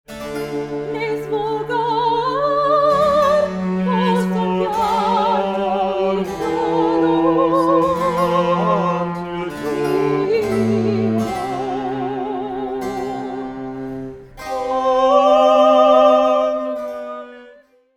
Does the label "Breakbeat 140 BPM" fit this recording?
No